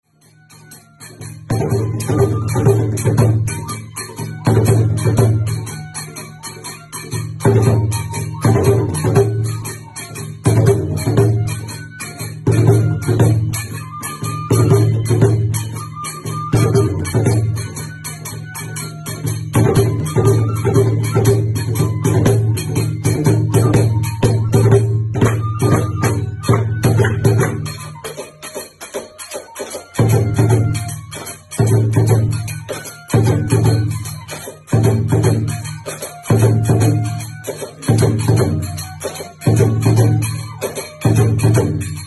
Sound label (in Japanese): kaguragongenmai